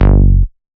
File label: Slide F#, Bass